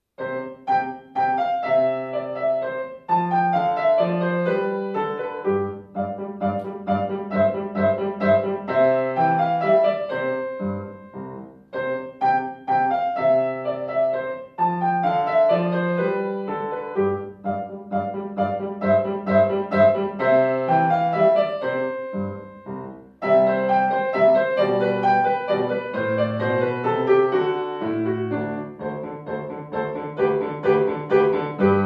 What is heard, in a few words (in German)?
Menuett C-Dur, Klavier; L. Mozart